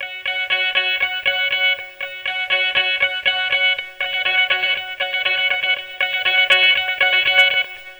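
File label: Guitars 120 F#; Zero-G Kocktail Kollection; Adrennalinn 2fers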